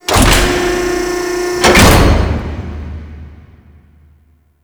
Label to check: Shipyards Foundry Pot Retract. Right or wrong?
Right